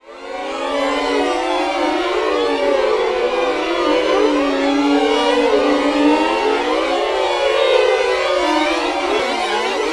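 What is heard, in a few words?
VLN SLOW SLIDE, Violin; Q Up Arts; Denny Jaeger Master Violin Library; PERFORMANCE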